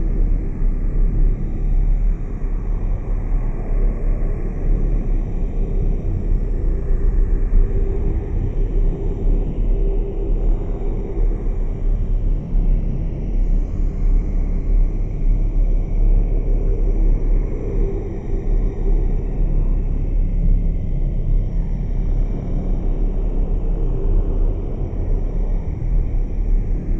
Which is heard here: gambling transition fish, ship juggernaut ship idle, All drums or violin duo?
ship juggernaut ship idle